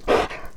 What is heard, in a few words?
breath; crystal maiden